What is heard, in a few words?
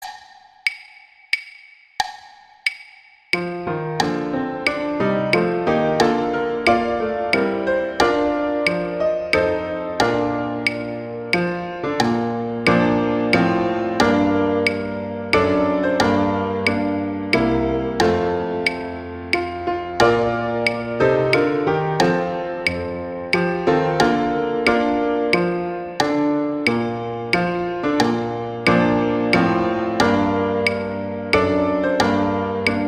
The Star Spangled Banner; USA Anthem